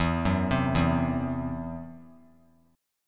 E Suspended 2nd Sharp 5th, Esus2#5 chord; arpeggiated; 16,13,15,14

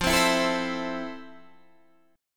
F Major 7th F, Guitar, DADGAD

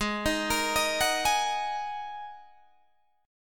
G sharp 7th Suspended 2nd G sharp Guitar Open D

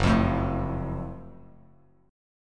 Gb Minor Major 7th Gb down Bass Standard; strummed; JGuitar

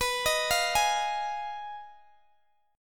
B 6th Flat 5th B down Guitar Open D

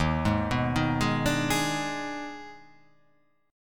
D sharp Major 7th Suspended 4th Sharp 5th

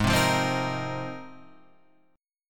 Ab 6th Add 9th down Guitar Standard; strummed; JGuitar

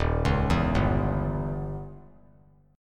D sharp 7th Flat 9th D sharp; arpeggiated; JGuitar

